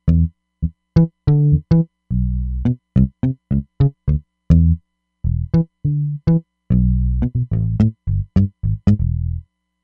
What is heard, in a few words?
Thumb Up, Roland Fantom XR